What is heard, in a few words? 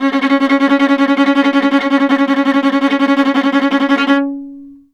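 viola tremolo C#4; Orchestral; DirectWave; Varazdin Orchestral; Viola II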